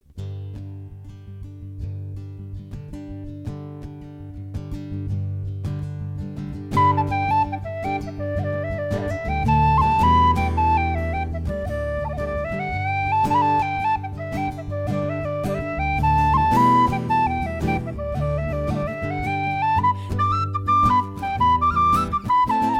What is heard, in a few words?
Slip Jig; Bog Cotton; Na Ceannabhain Bhana